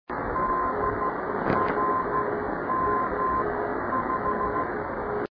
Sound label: drm; 218 kHz (Drummond Island, Michigan); NDB